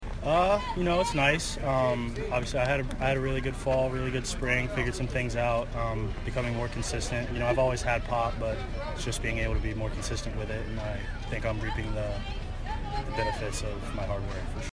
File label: Bell